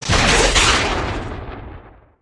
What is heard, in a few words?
launch, melon launcher; garrysmod